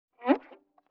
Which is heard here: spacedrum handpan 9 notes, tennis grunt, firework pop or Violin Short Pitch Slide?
Violin Short Pitch Slide